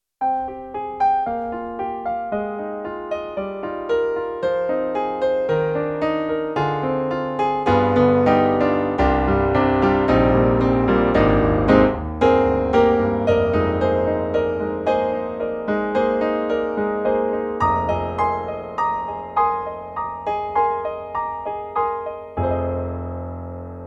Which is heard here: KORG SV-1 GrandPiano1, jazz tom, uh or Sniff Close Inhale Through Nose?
KORG SV-1 GrandPiano1